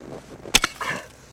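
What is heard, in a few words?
Dig Single Exterior, shovel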